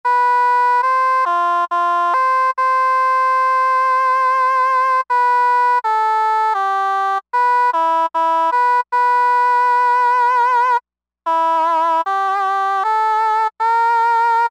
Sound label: oboe, Korg M-500 micro-preset